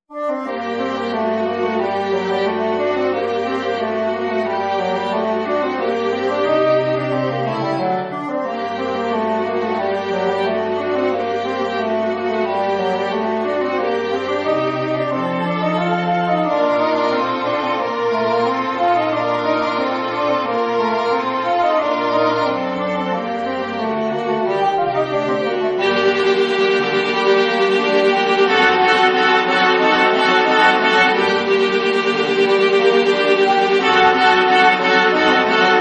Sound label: Quartet